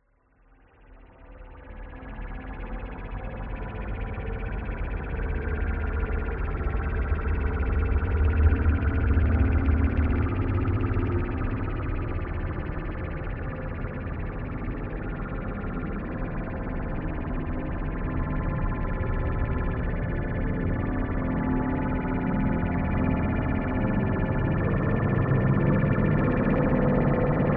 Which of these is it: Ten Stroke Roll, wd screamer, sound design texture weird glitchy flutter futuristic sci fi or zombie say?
sound design texture weird glitchy flutter futuristic sci fi